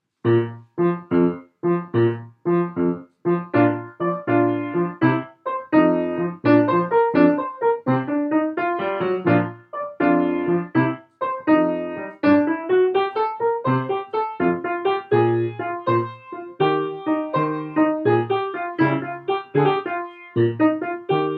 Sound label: instrumental